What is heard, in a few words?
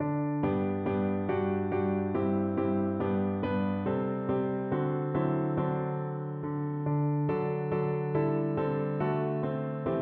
ten joys of mary piano